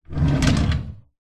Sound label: office drawer wooden open or close